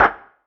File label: perc organic crack